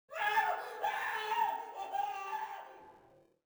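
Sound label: scream, female; Soldier Of Fortune II Double Helix; XBOX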